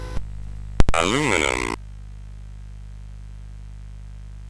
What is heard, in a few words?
sid CSG8580R5